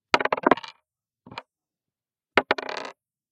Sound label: Dice, Contact, Die, Roll, On Table; UberDuo; Game Night Audio Props; GAMEBoard